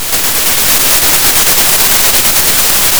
pop, Sound of a thunderstorm

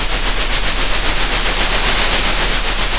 pop, Sound of a train

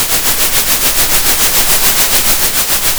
center, Sound of a train

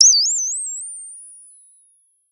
Sound of a bird tweeting nicely

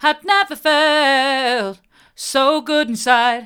hard, vocal

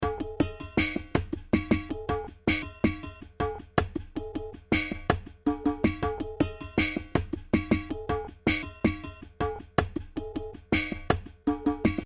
assorted cans

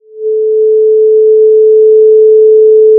pop, Sound of a cruise ship horn

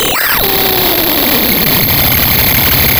pop, Sound of a cat meowing

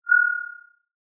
Sound of an owl

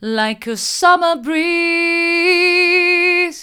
like a summer breeze hard, vocal

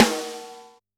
Snr, drum

